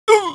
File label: damage, pain; mohaa; dialogue